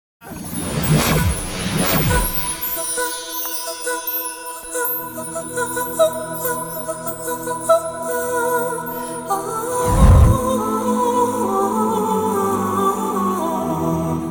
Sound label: Theme Music; Anbe Aruyire (2005)